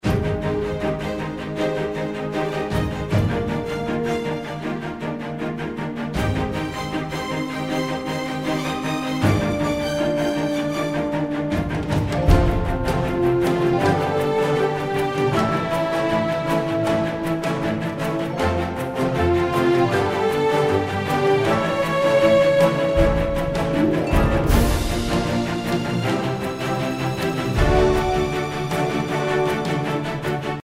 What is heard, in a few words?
Minigame Run; Doodlekingdom; Doodle God Puzzle Collection